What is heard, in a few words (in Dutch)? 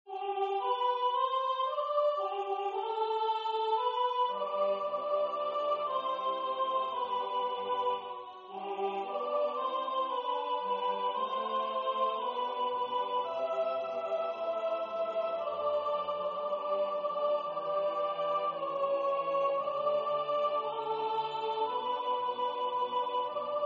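soprano 2, koor; F.Mendelssohn; Weihnachten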